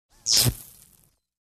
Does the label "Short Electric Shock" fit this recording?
Yes